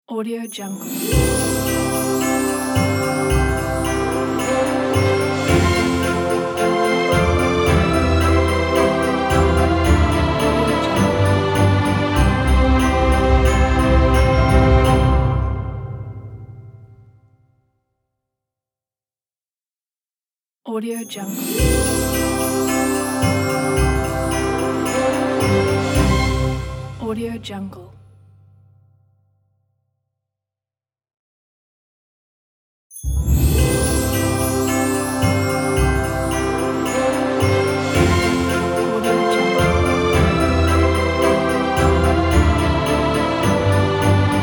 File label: christmas orchestral logo; wavebeatsmusic